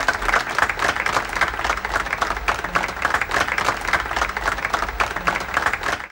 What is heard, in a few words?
APPL, XP APPLAUSE; Best Service - XXL Production Effects